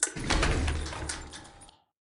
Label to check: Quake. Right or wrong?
Right